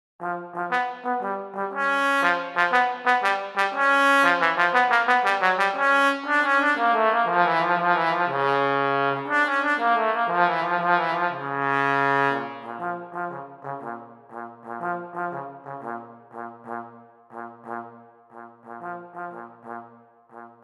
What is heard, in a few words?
Trombone